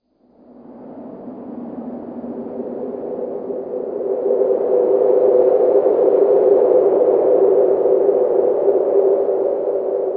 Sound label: Wind; Five Nights at the Chum Bucket